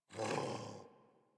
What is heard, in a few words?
Puppy Sleep Idle Snore; Warframe; Kubrow